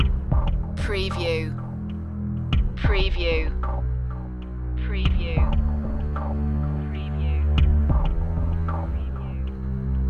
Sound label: tension loop underscore